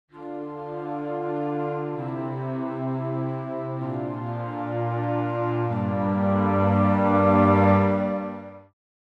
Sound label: Traditional Low Ensemble Unison Bends; VENTO